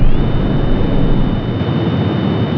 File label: Rocket, thrusters; 16bit